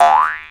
Jaw Harp Boey; Planet Earth; Digital Sound Factory Planet Earth

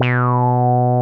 JUNO FUNK B3; Drums In Your FACE!

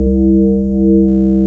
BAS, Eurotech; Sounds Good

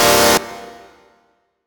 Stab C2, Club Techno; Zero-G; Stabs

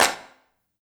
83.5 KLAP

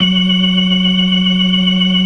FAST VIB, ORGAN, TREMOLO VIB

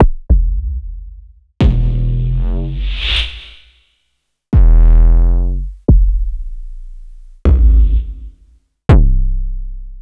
DRM

